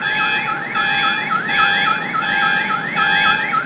Alarm; ambient; Project Gotham Racing

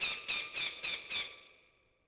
hawk; Ambient; MX vs ATV Unleashed

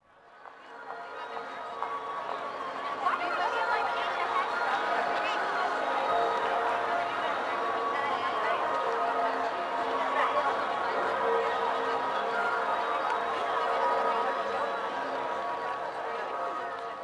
Ambience; Flo Plaza; Project Gotham Racing 2

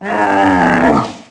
Fall Fore and Slump, Ice Beast

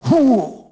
man hit, duel; Pirates Of The Caribbean

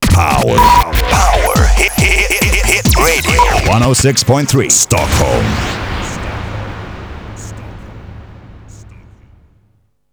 Power Hit Jingle; Project Gotham Racing 2; radio; Power Hit Stockh

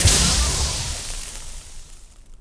vacuum Tube slice; impacts explos

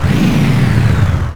Vehicle Selected; Pulse Racer

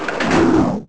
Blade; envrn; Sotha; The Elder Scrolls III Morrowind GOTY; XBOX

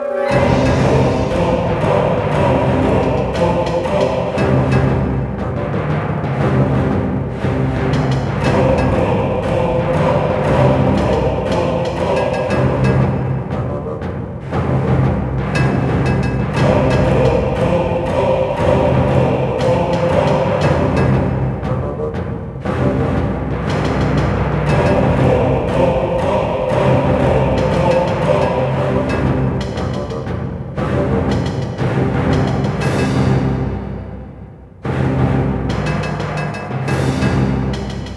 music swamp; The Legend Of Spyro A New Beginning; XBOX

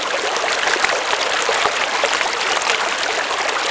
river; Pirates Of The Caribbean

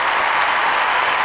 Amb Crowd; Cars; XBOX

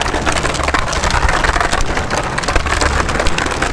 roll wood shingle; terrains; Tony Hawk's Project 8; XBOX

